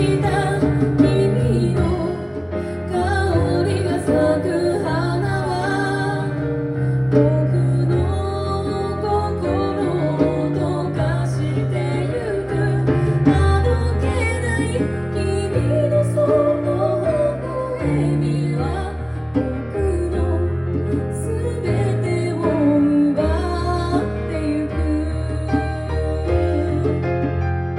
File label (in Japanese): (Key.Perc.Vo)